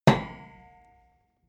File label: metal impact girder